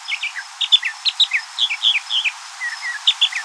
mocking, avisoft

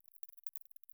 Myotis nattereri

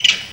Macaca fascicularis call

